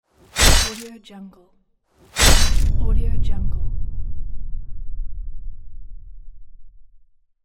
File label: knife stab sound